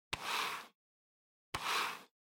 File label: PC Mouse Move Hard Pad Hit